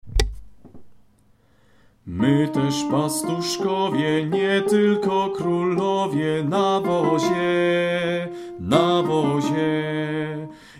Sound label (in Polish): My tez pastuszkowie alt; koledy